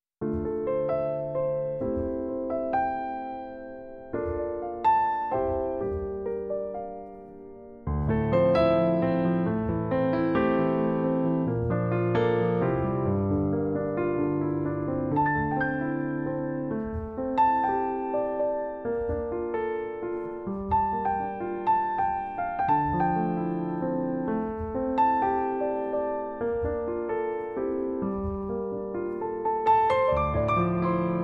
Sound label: Piano Version